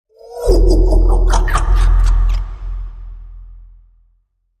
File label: Dark Laugh Sweep